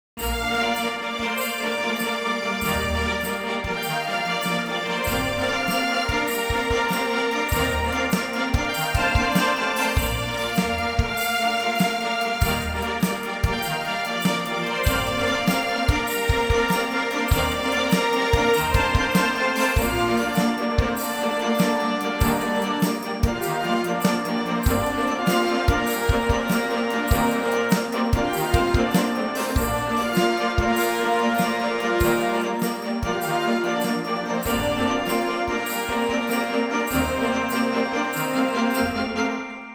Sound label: epic Christmas theme